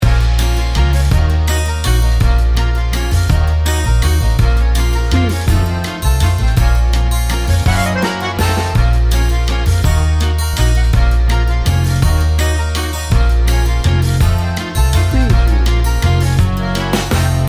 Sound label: wine and romance loop